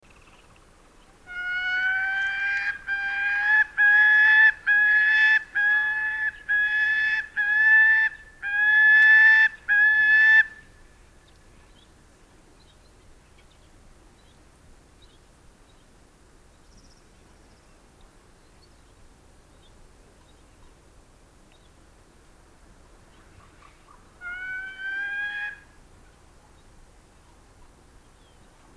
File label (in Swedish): Jaktfalk